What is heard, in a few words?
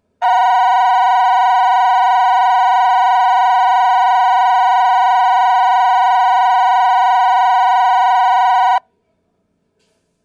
kiso fukushima, station; jr central